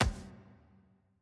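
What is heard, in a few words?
ambience room polygon punchbag training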